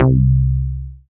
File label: BAS FM DX-7